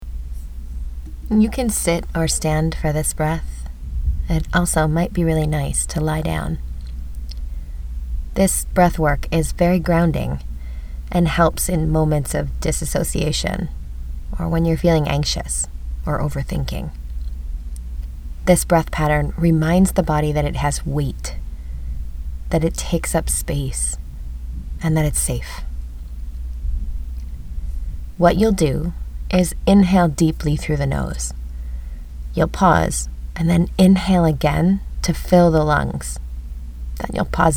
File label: Double Inhale, exhale anchor